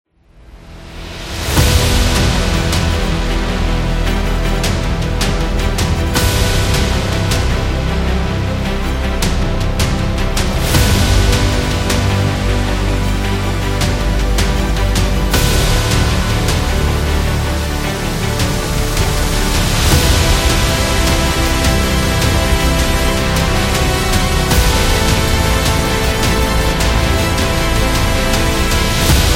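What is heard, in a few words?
Patriotic Trailer, epic cinematic trailer music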